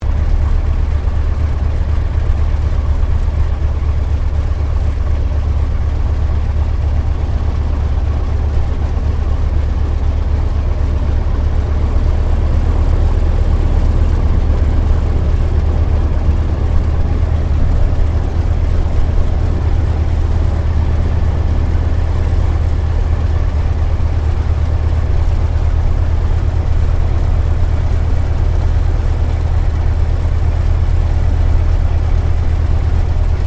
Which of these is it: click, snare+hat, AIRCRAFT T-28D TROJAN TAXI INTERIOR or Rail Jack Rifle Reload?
AIRCRAFT T-28D TROJAN TAXI INTERIOR